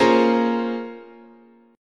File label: Bb Minor 9th, chord, guitar